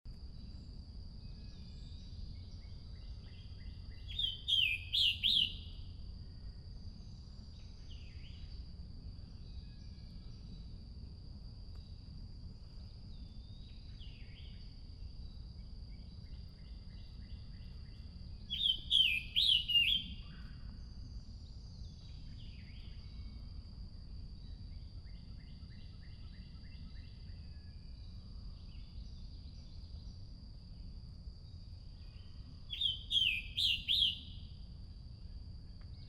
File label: Scarlet Tanager; Howard MD